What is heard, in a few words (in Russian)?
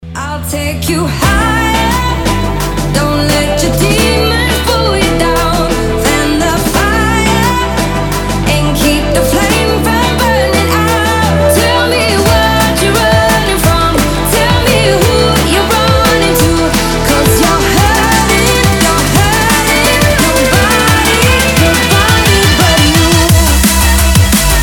vocal drumnbass drumnbass s krasivym zhenskim vokalom